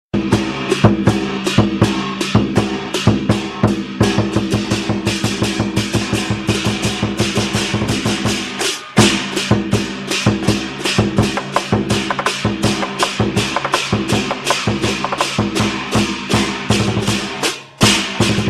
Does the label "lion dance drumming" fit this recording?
Yes